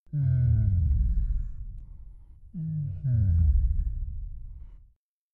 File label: Low Vocal Power Down, servos